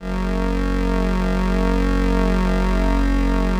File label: LAMBDA, String Machines